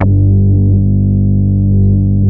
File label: TOUCH LO G2, OBMX BASSES